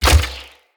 Hunter Bow Ranged Shot; Weapons; Player; Envoy; Bows

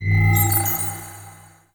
Class UI Open; Warframe; The New War; Zariman Class Quiz

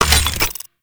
Mech Oil Grenade Ready; Warframe; Nechro Mech; Abilities

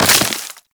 Melee Impact Wood

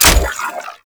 Sniper Reload Clip Out; Weapons; Warframe; Sentient; Caliban Prime Sniper

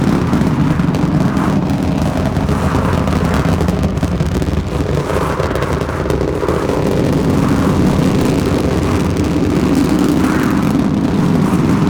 Hoverboard Engine Hover Loop; Warframe; Vehicles; Venus

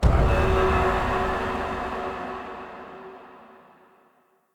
Puzzle Rune Hit A; Circade Community

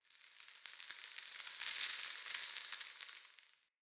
Cave Dirt Trickle

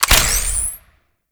Pistol Ready; Narmer Outpost; Drifter

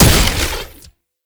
Pistol Projectile Explosion; Weapons; Warframe; Tenno; Temple Signature

